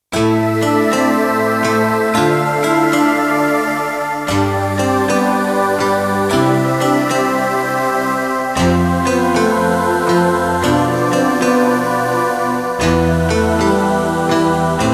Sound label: Vox Guitar; Kawai K1; Bank 2 card A